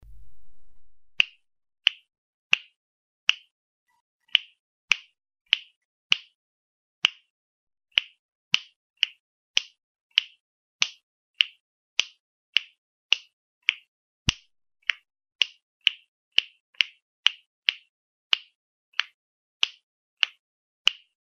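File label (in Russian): щелчок пальцами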